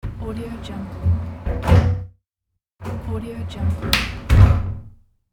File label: shower door open and close